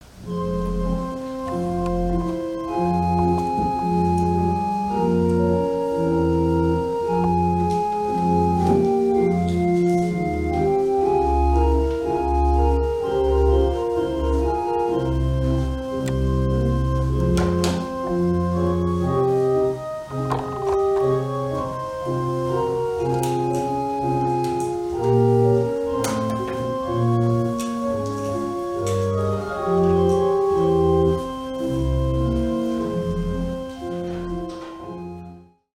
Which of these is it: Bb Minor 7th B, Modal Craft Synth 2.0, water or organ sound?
organ sound